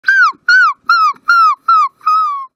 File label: dog whine